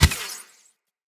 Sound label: Elemental Radiant Arrow Explosion, Bows; Weapons